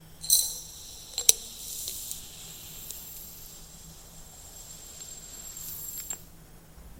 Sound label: Hourglass